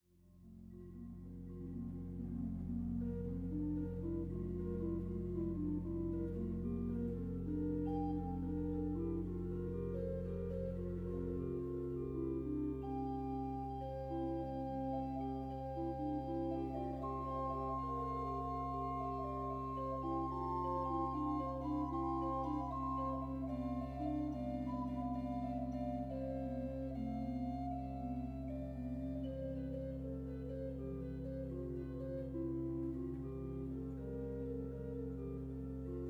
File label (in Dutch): Hinsz-orgel klankvoorbeeld; Appingedam